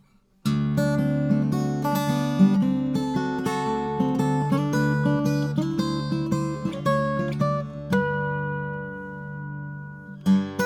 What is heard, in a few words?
KSM44x